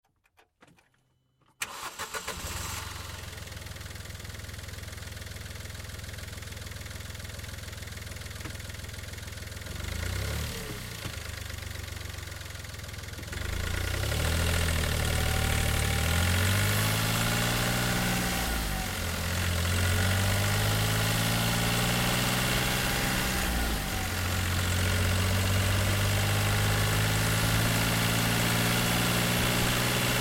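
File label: Car Smart Car Onboard Drive Slow; 2005 Smartcar Fortwo; Vehicles; Big Room Sound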